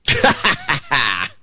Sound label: laugh